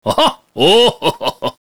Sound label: Large Win; santa